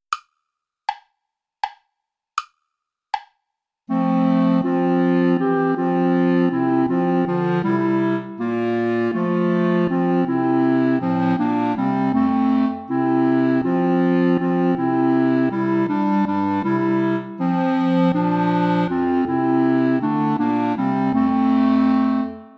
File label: play along without clarinet